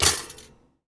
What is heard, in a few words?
Foley